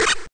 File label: eat Run; Dino Run